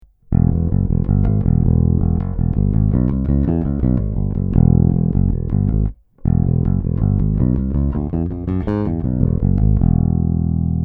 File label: Cort B4 plus AS Neck PU active